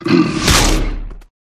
IceBear A mid

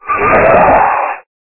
bat death

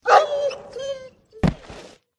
dog attack death

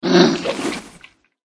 zombie attack voice middle

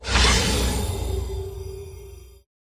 bug stun

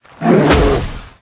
IceBear A up

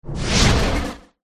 Air Spirit Block

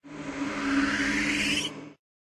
Dark Spirit Stun

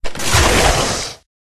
Bike

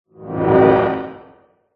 dragon blue attack voice bottom

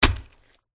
attack contact bottom

